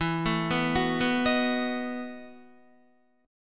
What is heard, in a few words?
E Major 7th Suspended 2nd Suspended 4th E, Guitar; EM7sus2sus4 arpeggiated